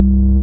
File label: DM ORG1, Dance Mega Synth